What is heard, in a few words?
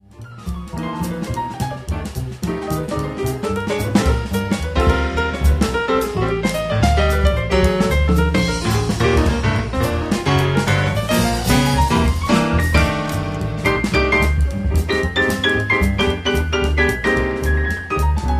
Low Groove Excerpt